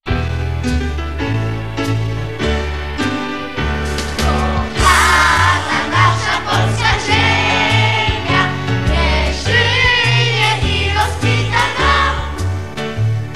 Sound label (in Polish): szkolny pop